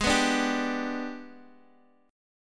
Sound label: Ab Minor Major 7th Flat 5th, down, Guitar, Half Step Down